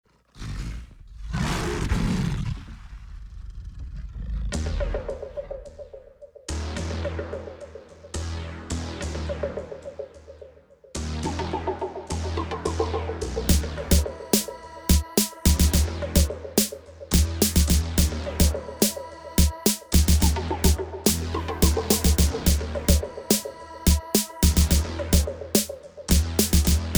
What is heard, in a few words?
Animal Jazz